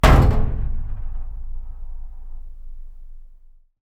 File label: barrel hit, metal barrel hit